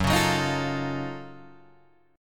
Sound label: Gb Minor Major 13th Gb down Guitar DADGAD